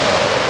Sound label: Sfx Winter games